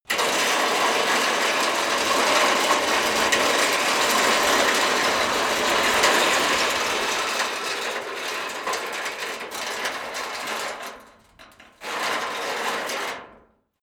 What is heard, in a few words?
metal light scrape